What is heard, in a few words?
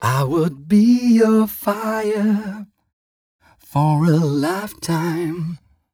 m 129 mel e, Vocals